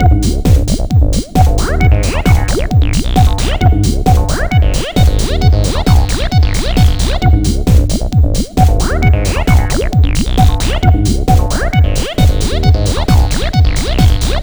New Serious Beats; 100 acid vol 1

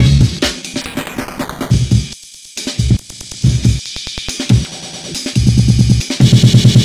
Amen Breaker 140Bpm; vst fx bundle

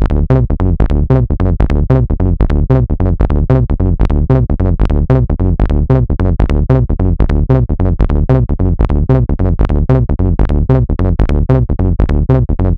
Trombone Kool; abx3 trance presets vol2

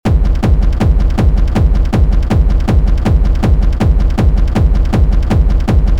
audacious shutdown, hard techno kick; hard techno kick generator

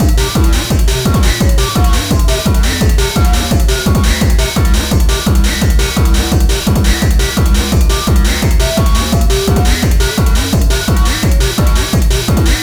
Flanger; Flanger-Revolt; Hard Acid Presets for ABX3; Acid, Hardcore & Tribe Sounds

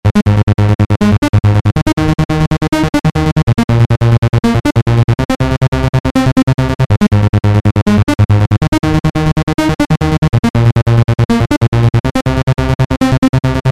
Bass Trance Love; abx3 trance presets vol1

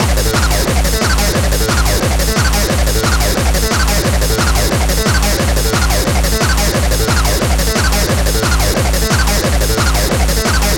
Close To Break, hard acid; Hard Acid Presets for ABX3 – 128 Explosive Acid, Hardcore & Tribe Sounds

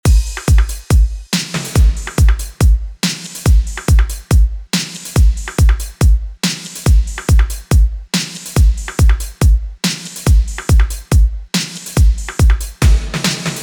DuB; drum; beat; Drum Blaster: The Ultimate Beat Generator, Sampler Plugin for Modern Producers

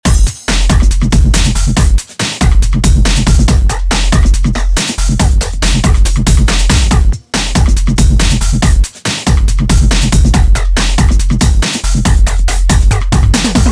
Break, drum beat; Drum Blaster: The Ultimate Beat Generator, Sampler Plugin for Modern Producers

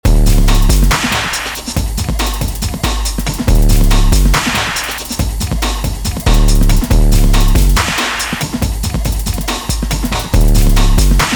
808 Trap; drum; beat; Drum Blaster: The Ultimate Beat Generator, Sampler Plugin for Modern Producers

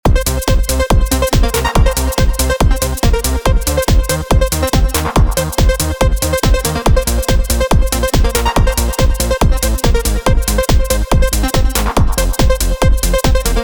Bass and Lead; Hey RTM; neo retro 8 bar loops and midi files

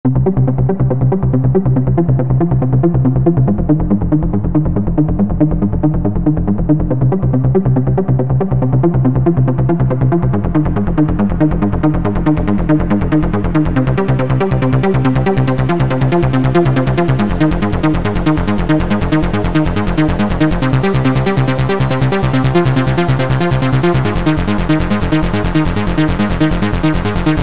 Trance; abx3 trance presets vol1

